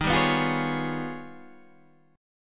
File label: Ab Minor Major 13th